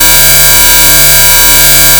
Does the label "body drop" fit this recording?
No